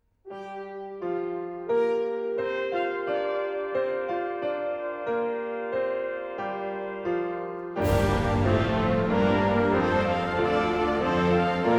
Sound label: Concerto for Piano and Orchestra